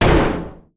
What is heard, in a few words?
metal hit